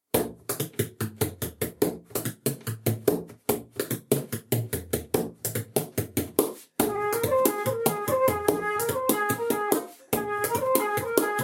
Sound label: Bodhran